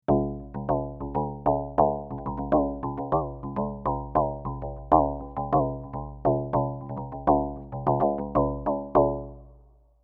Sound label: Harmo Drum